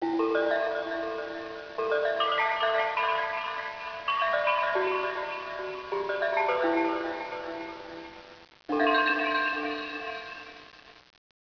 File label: BUSH